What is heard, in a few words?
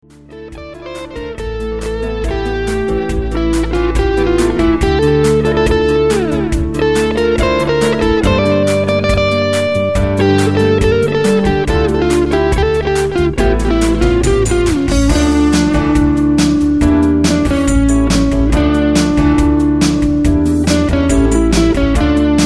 Modal Harmony